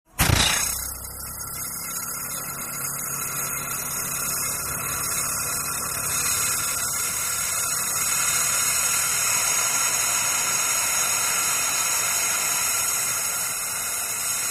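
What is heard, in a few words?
High Pressure Sputter, steam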